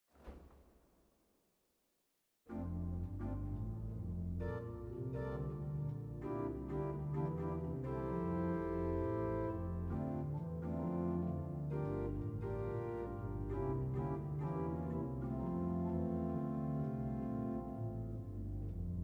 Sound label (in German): Improvisationsskizze, pcorgan; Sacroproprium